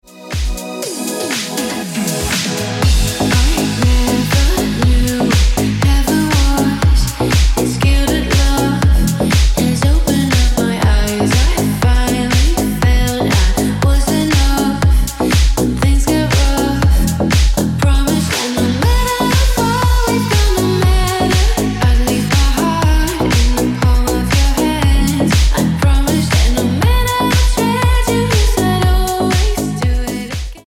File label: Ringtone Deep House Music